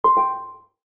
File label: button